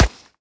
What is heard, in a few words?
sand, bullet impacts; physics; Half Life Model Pack